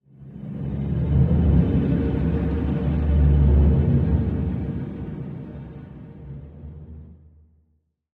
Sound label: Stinger; Crimson Stinger Dark6; angry birds star wars 2 android ios 2013